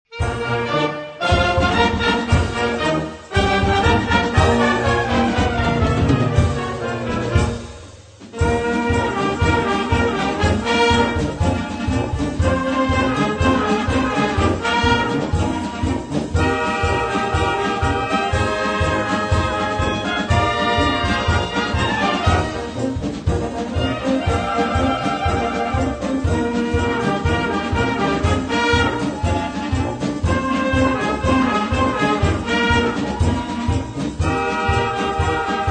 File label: samba